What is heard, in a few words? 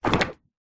hospital xray sheet place on light display